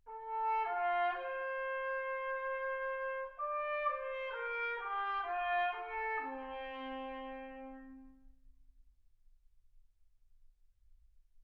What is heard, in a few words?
trumpet